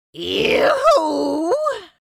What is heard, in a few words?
Witch fantasy vocalization pain hurt